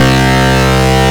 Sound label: Synths Note C